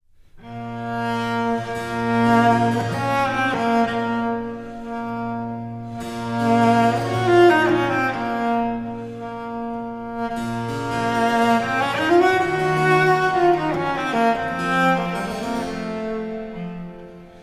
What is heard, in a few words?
concerto for cello in b minor largo